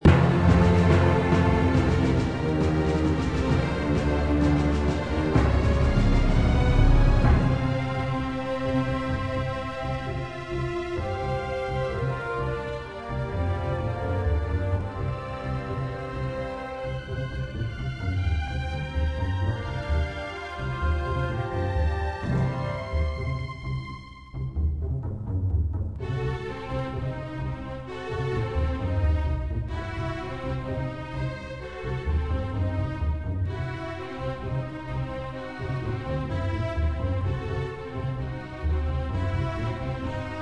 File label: Mission Complete; Tachyon The Fringe